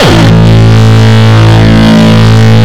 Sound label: Longkick, kicks